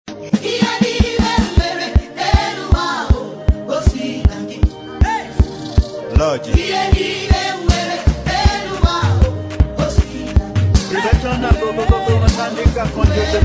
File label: Professional Beat